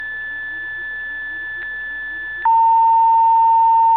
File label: Fire